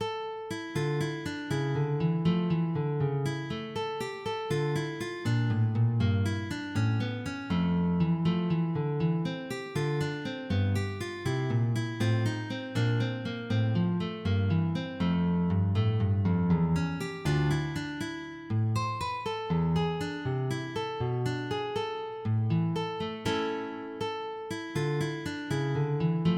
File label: Gigue